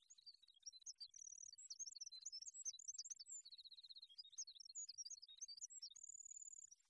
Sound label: Wi Wren